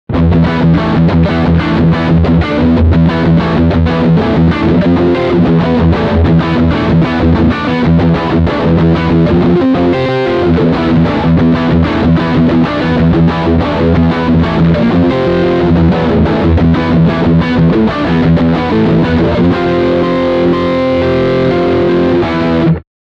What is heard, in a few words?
Metal Riff Front Humbucker